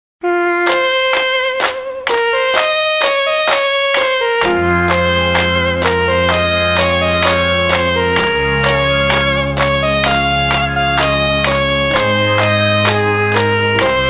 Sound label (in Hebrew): גינגל